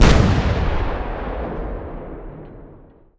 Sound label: ships destroyer attack; Hearts of Iron IV Death or Dishonor